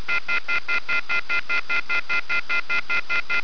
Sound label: offhook